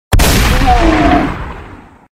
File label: shoot no voice; doomcv